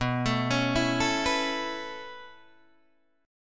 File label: B 7th Suspended 2nd Suspended 4th down Guitar DADGAD, chord; B7sus2sus4; arpeggiated